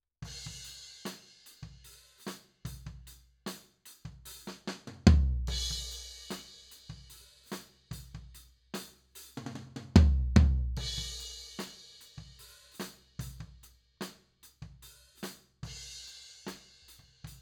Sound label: Floor